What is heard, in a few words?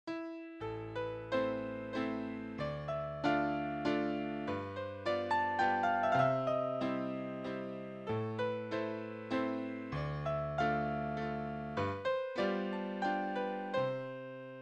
Chopin, Waltz in A minor; B 150